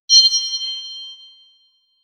clean surface shining effect, cleaning

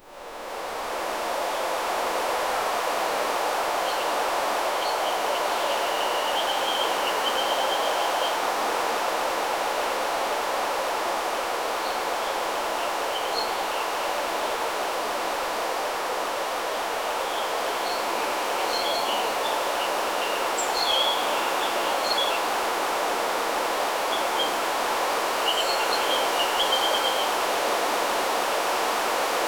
light wind soft breeze

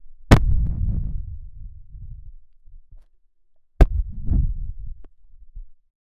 impact of a football tackle

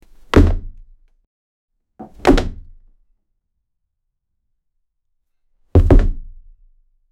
a body falling onto a wooden floor with a thump

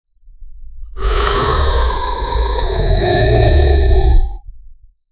faint demonic roar

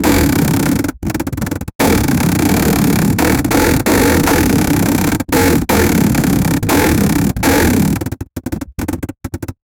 fast forward sound zrrrri

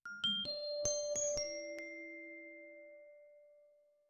bright xylophone flourish, ascending

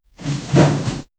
swinging the ropes latera, gym

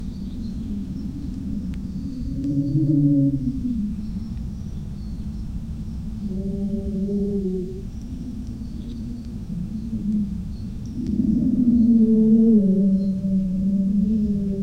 peaceful green landscape

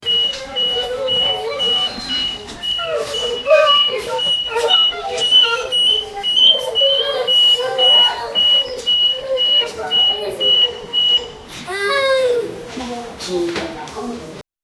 hospital beeping whilst v; amputation

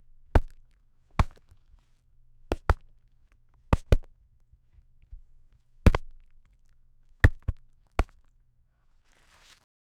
leather glove catching pu, hockey

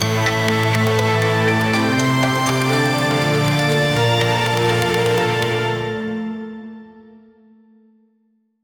start of story livelier, dramatic

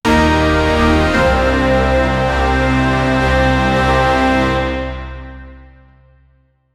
dramatic and cinematic

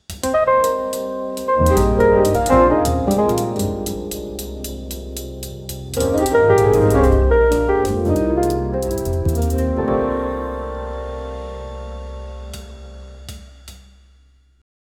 smooth and atmospheric ja, jazz

